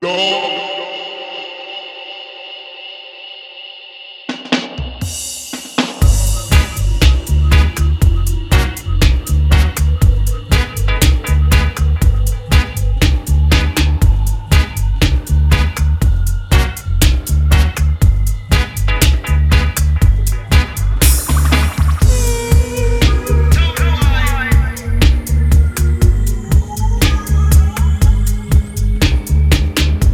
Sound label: dread drumz mix; dread drumz by soundfingers